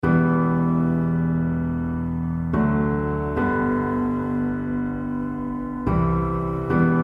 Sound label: Acoustic Inst